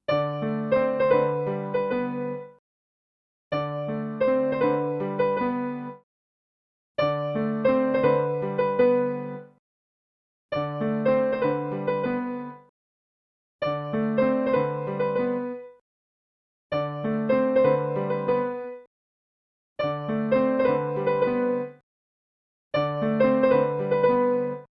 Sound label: 10 repetitions of grace note 5 with increasing local tempo; exerpts from the whole theme